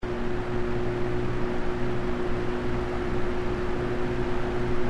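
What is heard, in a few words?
Fleece Medium; K-Tek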